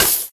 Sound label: Drop Money Bag